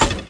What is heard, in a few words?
build wood